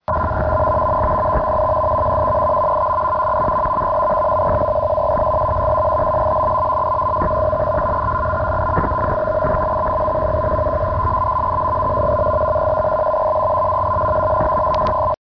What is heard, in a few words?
ARQ-M, digital modes